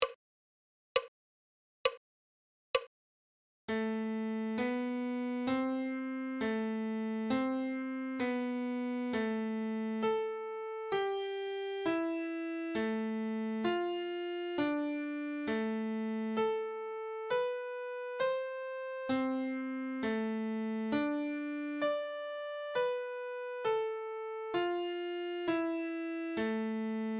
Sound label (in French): Clé de Sol, Adagio